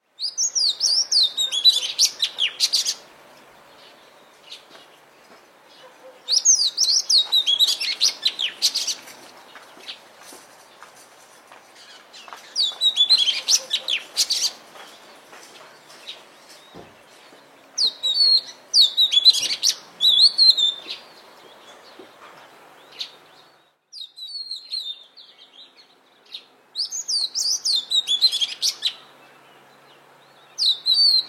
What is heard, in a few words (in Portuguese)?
canto do magpie robin das Seychelles